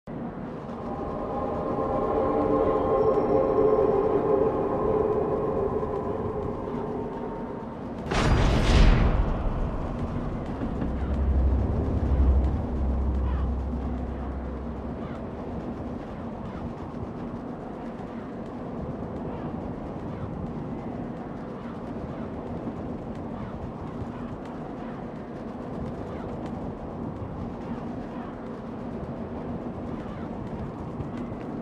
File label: door of palace; باز شدن